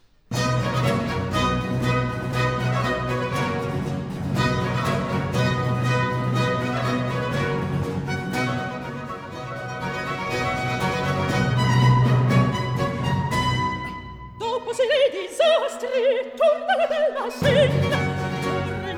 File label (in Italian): Aria; Scena 14; (Manlio); Dopo si rei disastri; Antonio Vivaldi (1678 - 1741); Vivaldi - Tito Manlio (2005)